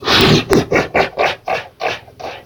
idle, overlord wizard

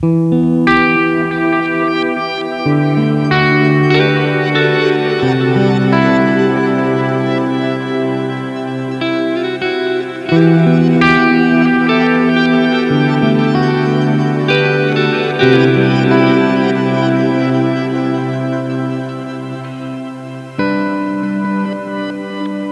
guitar

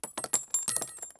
shells land

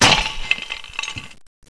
bust glass, debris

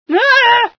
scream, female sci

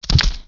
run, licker

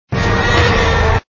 alert

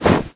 grenade throw

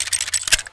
saw belt out, m249

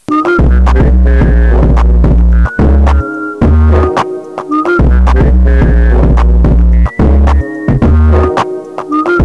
drip car

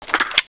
p90 mag out, weapons

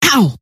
pain, scientist female

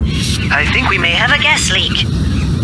gas leak

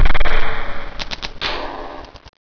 door stop

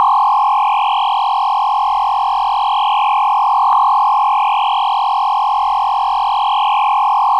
pagoda, ambience

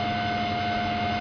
idle computer

